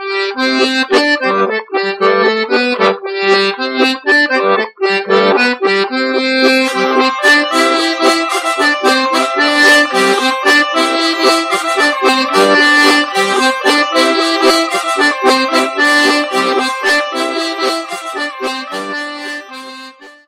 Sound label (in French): Bourree de St Flour france auvergne